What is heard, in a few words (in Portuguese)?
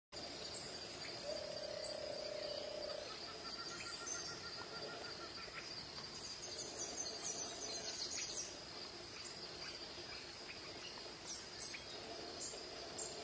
Canto do capitao de bigode limao